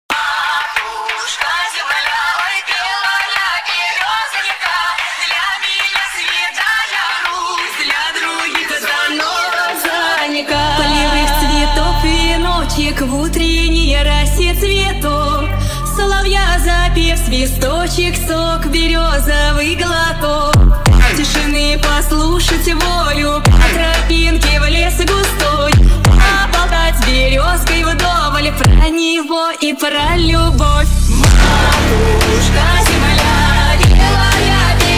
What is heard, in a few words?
Slowed Remix